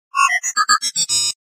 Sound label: beep; borg cube